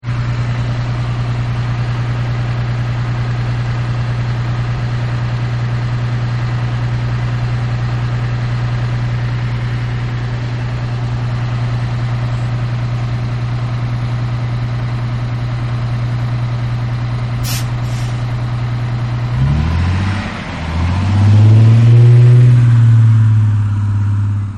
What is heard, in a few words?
Bus Door Closes And Drives Off, Bus Engine Purring, Exterior Perspective